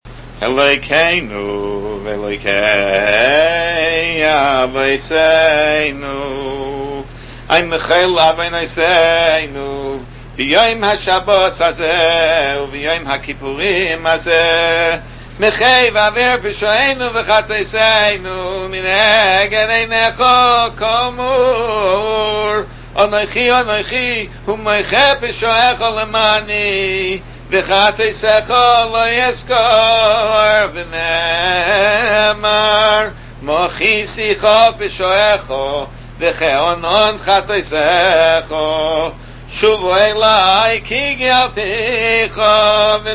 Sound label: Michol, nusach